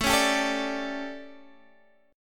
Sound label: D Major 7th Suspended 4th, chord